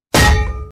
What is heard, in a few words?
hitting